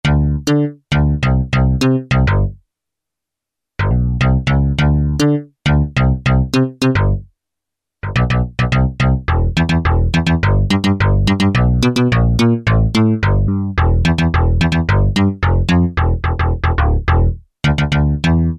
FM BASS; Akai SG01v

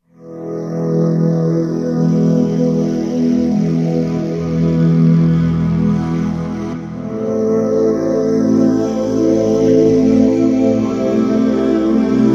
Monestary, Roland V-Synth

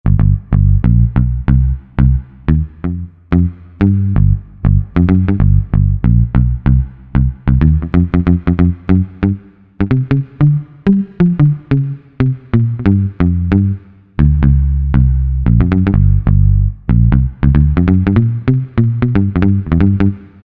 Pick Bass, Korg M1; Factory preset examples

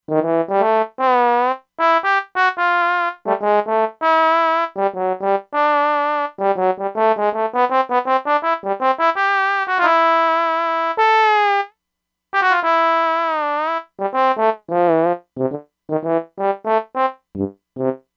Trombone, E-mu Proteus-2; Factory Presets

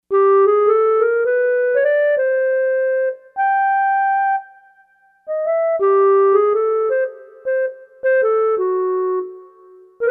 Clarinet, Korg MSC-4S Orchestra; Orchestra 1

